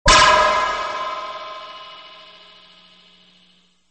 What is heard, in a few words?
Laser Beam Hit